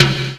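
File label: electric snare; drums; one-shots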